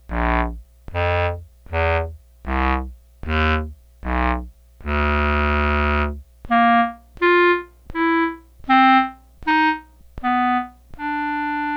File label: bass clarinet clarinet